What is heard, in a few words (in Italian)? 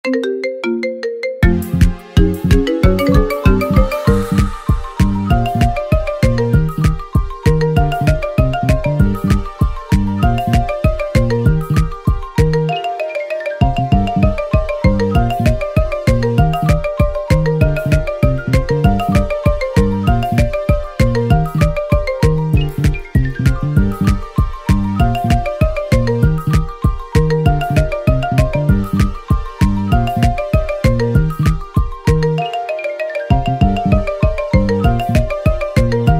Marimba suoneria